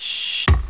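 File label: EXPLOSION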